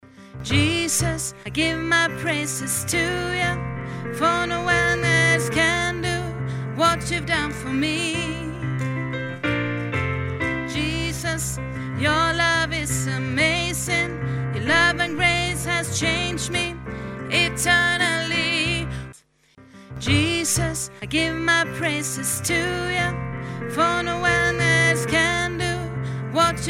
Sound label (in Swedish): sopran